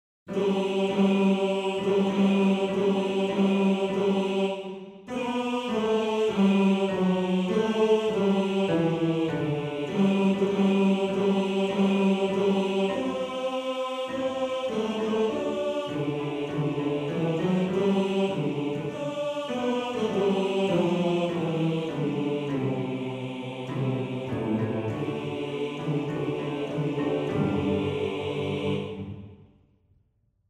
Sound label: Bass; Quel Mazzolin; Bass 2